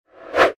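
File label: comic humorous swing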